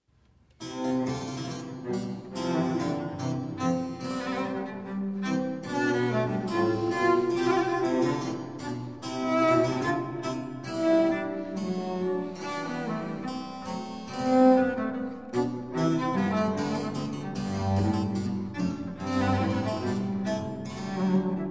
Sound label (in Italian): Sinfonia In Do Maggiore, Amorosa, Violoncello e Basso Continuo; FP Scipriani; Sinfonie and Sonate del settecento italiano per Violoncello e Basso Continuo